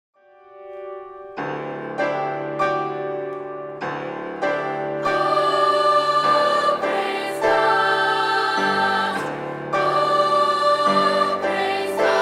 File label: Senior Choir Clips